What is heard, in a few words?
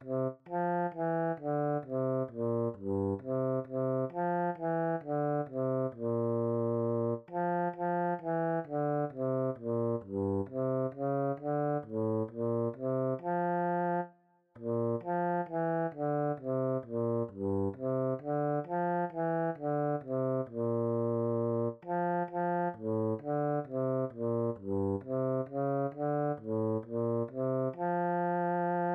Should auld acquaintance be forgot bas; Danske sange